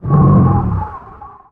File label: Menu Horror; Menu FX